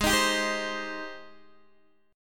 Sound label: Ab Major 7th Ab down Guitar Open D